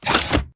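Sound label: Stalker Movement Servo Head Retract; Titanfall 2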